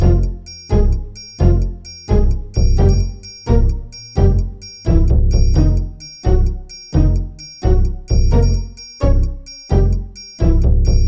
elevator

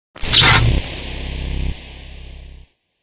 zap tv

